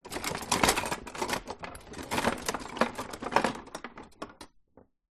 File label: large utensils movement in drawer; household; zapsplat